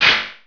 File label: xskid; MMX4SFX